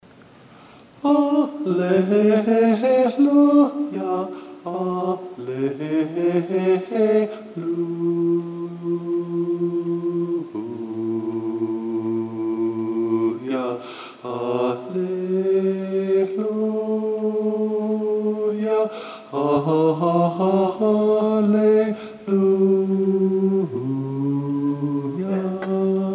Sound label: Dic nobis Maria Bass